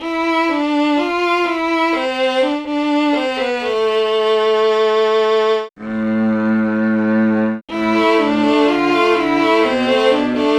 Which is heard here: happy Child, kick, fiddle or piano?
fiddle